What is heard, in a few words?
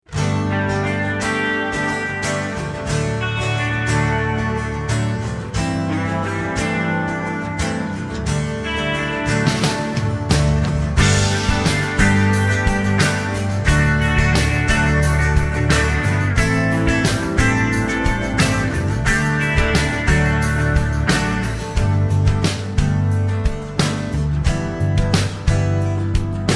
(G) No Backing Vocals